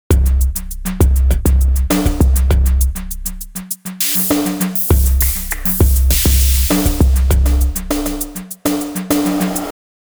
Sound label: drumloop; sugar bytes; effectrix